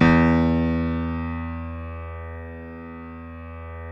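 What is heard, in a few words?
E2 piano; Northstar - New Gold VOL-2; BOS.GRAND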